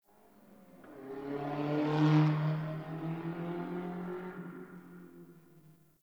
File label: Distant Traffic C; Warframe; Ambience; NineteenNinetyNine